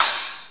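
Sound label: (G3) Splash Cymbal; Star Trek JR Audio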